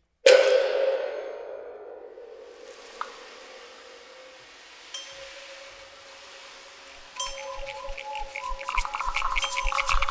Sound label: Percussion; Percussion-Improvisation; 1. Aufnahme, Rudolph-Steiner-Schule Salzburg; Der Kopf macht die Musik